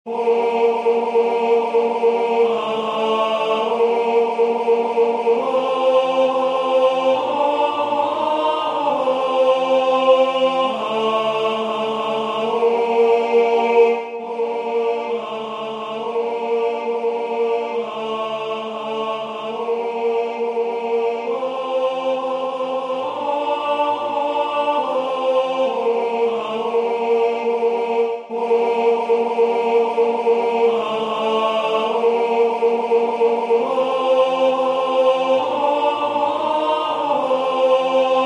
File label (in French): tenor; Viens Esprit de sainteté